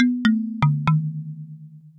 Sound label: grave jingle; gbjam12; jingles